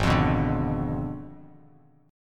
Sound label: Ab Diminished 7th, Abdim7 chord, Bass Standard; Abdim7 strummed; JGuitar